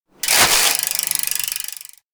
bike ride away; chiharu; anemoi

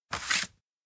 photo place desk; anemoi

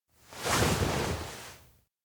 hug; anemoi

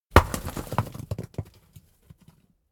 rockfall cliff; anemoi

